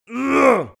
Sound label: male attack strained; schreie; menschen